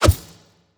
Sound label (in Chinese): 轻攻击; 音效; 战斗; 挥刀